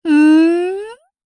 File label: hmmmm; Beatrice